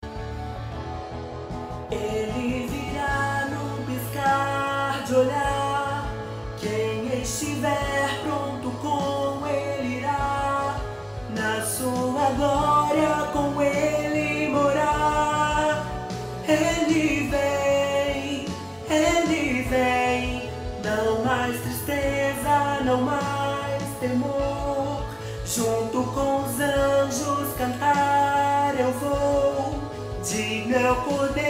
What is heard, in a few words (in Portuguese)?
tenor voz guia